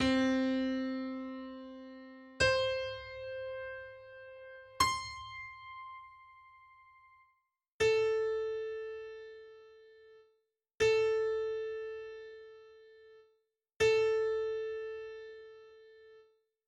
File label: Whole Notes in 4 4